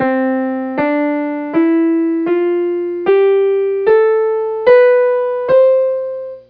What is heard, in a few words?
c scale